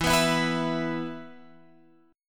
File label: E 5th, Guitar, Open F; strummed; JGuitar